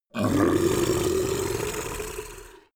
monster dark growl evil; kreaturen

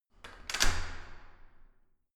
entrance door close normal

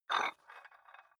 dumbbell turn nut out, fitnessstudio

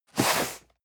cardboard box medium slide hard; objekte

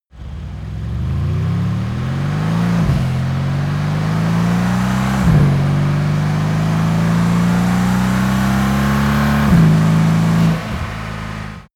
car mercedes amg c63 s accelerating

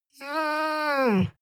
dog whine low complain; natur-tiere; hunde

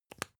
spice jar small close soft; industrie wirtschaft; gastronomie

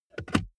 car bmw e46 interior center console close soft

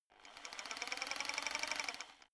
rock drill chisel slow short; maschinen; schrauben drehen hydraulik

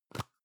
plastic can lid screw open hard; objekte; dose

